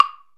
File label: WOODBLOCK; PERCUSSION; AMG - Vince Clarke Lucky Bastard